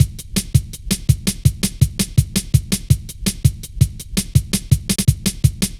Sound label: light stepz, drum loops